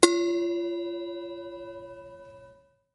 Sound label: bell